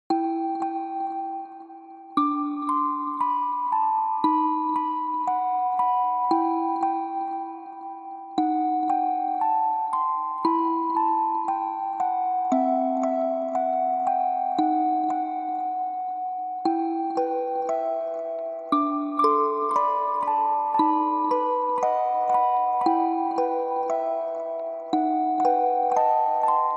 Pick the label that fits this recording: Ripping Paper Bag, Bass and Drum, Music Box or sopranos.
Music Box